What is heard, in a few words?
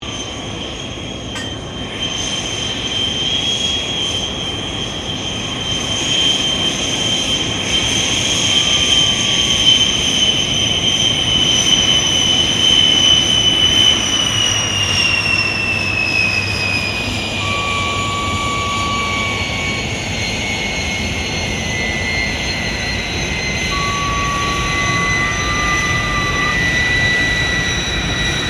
AIRCRAFT GLOSTER METEOR F MK8 TAXI SHUTDOWN STEREO, Gloster Meteor figter jet; Airplanes; Vehicles; Aircraft Collection